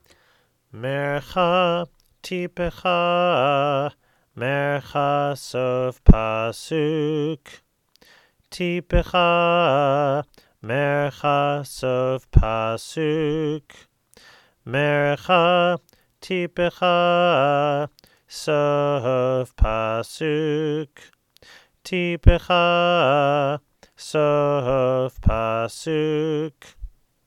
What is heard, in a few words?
Torah Trope Sof Pasuk